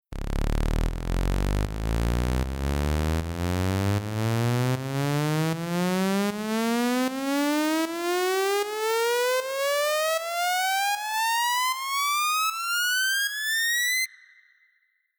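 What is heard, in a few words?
FutureBass Mix FX Riser Lead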